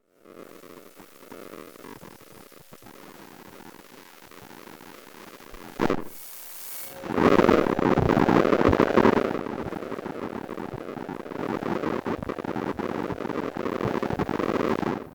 Electromagnetic buzzing emf interference

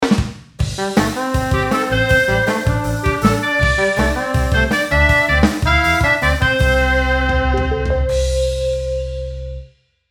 Sitcom theme music intro outro

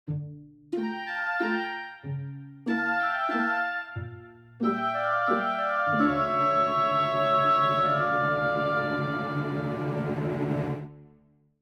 Game over you lose fantasy

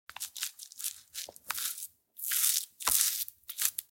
Soft egg wash brushing; cooking

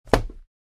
Calculator put down on table in office

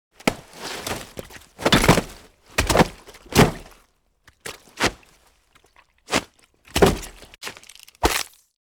Fighting in the mud movements body impacts squish

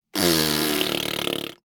Human funny raspberry

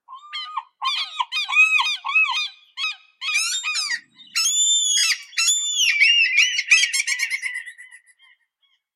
Seagulls calling large flock of seagulls

